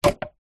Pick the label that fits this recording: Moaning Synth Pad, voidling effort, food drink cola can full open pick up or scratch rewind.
food drink cola can full open pick up